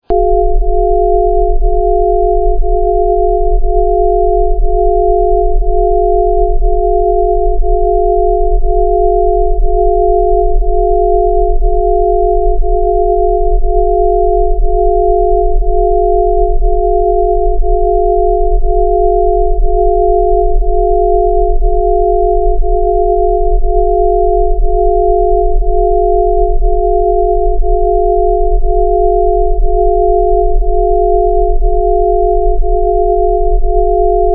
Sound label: 3rd eye negative clear tone, Solfeggio tone; Certain tones and frequencies have been used to balance and bring harmony to our lives.